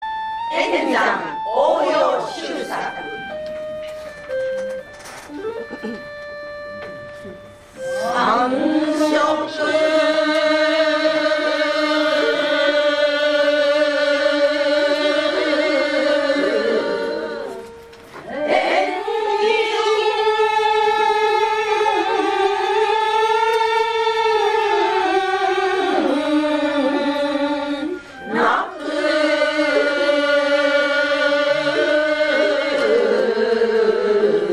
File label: gogin lady; enzan